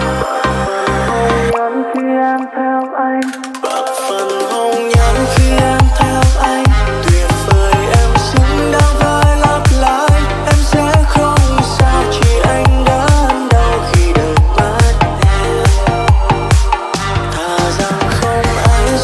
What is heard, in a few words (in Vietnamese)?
remix